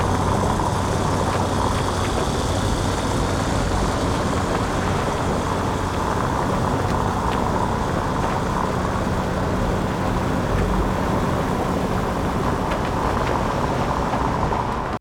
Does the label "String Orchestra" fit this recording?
No